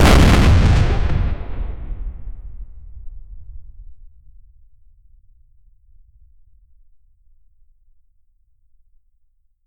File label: battlefield artillery, artillery